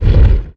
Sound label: Block; Playable Tutorial Level